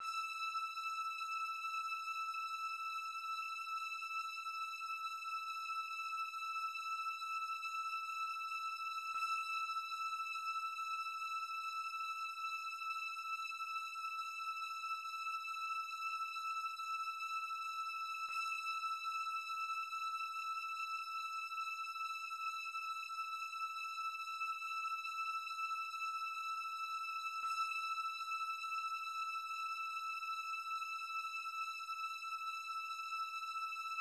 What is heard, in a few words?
105 BPM A Min String; Daylight; Retro Collection